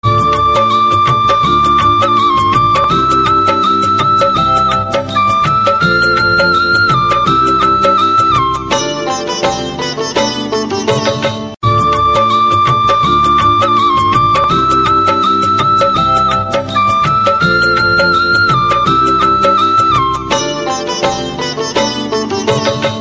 Nice Flute

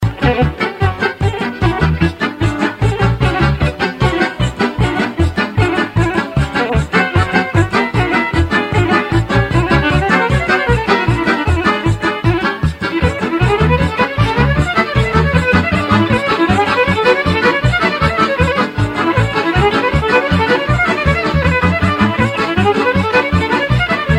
Serbian Old Music

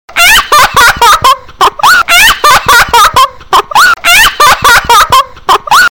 Silly Laugh

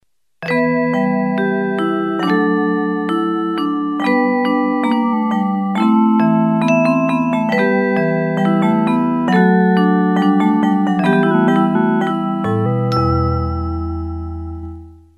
Rain; Mess

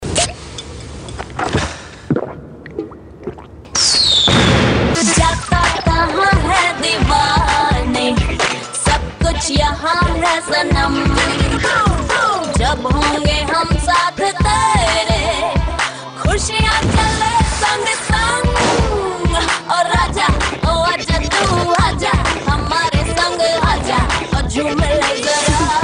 Diwali Tune

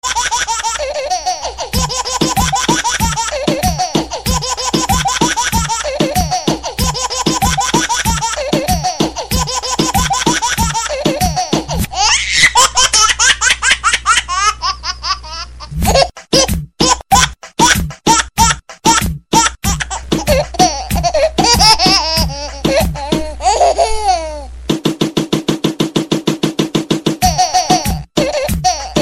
Hahahaha

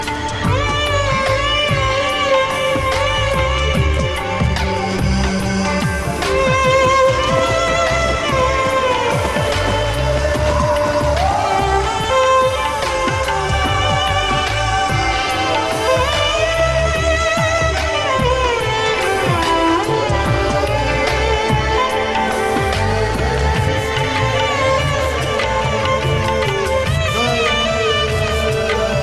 Bgm; 1000 Oruvan

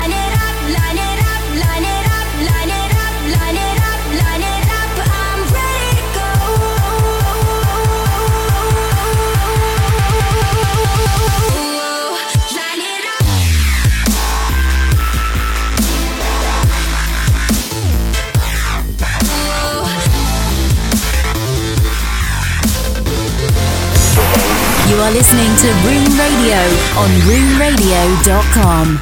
Dubstep

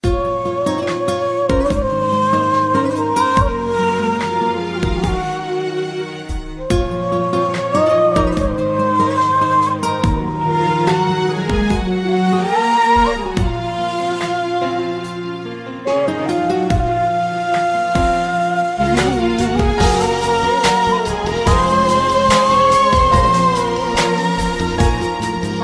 Classical

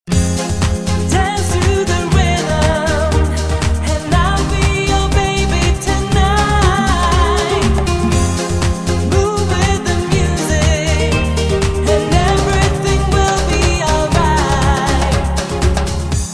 Nice Tune

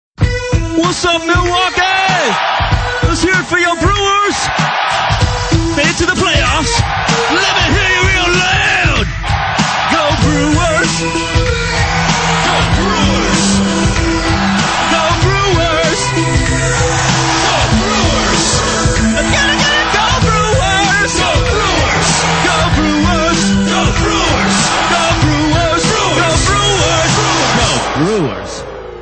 hip Hop

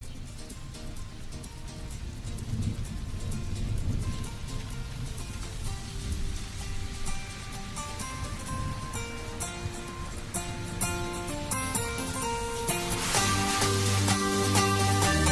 Morning Ringtone

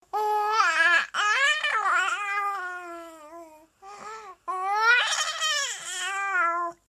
Baby Sounds

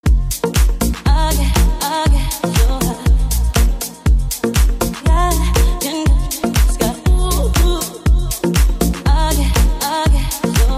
Best Dance House Ring

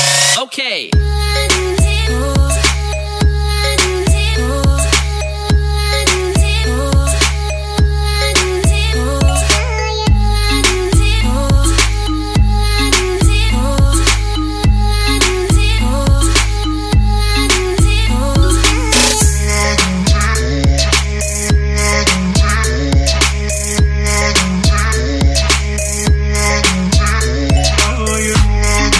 trap

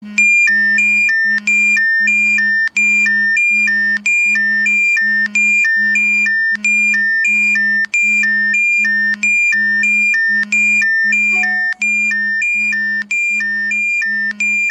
Two Tone Code Blue

